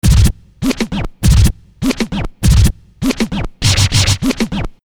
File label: Scratch, Hip-Hop